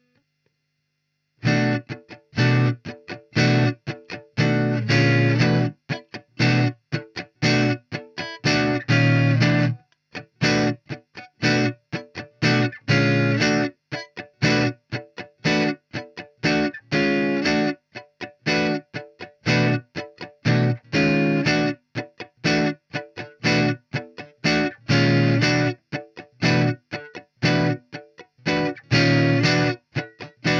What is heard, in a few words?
Amp Room Vox Clean; Softube Amp Room Bundle